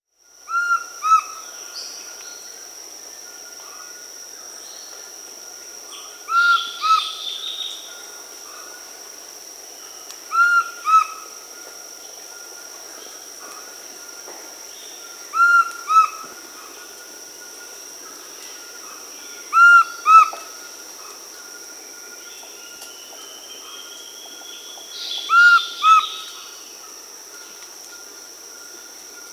Large-headed Flatbill (Ramphotrigon megacephala), Peru; Birds of the Amazon; S. America